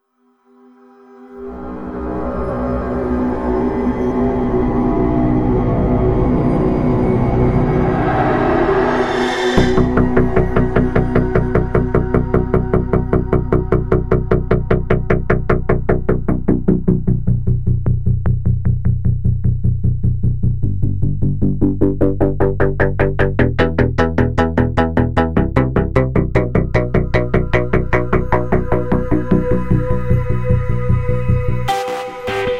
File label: hot poursuit ambiance bass